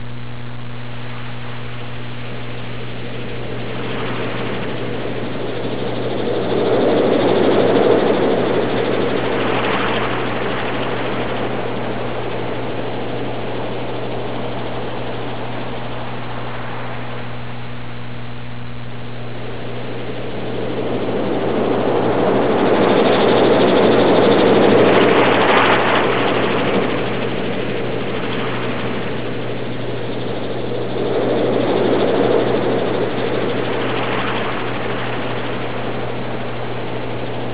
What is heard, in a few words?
Calm Sea